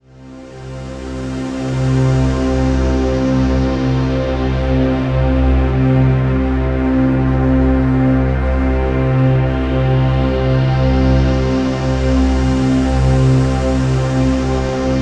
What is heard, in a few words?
Atmosphere Dissolve C; Ghosthack